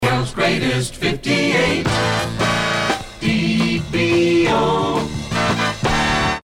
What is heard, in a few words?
jingle